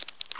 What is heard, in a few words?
shell; gunslinger